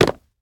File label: Jump Concrete Land; Devour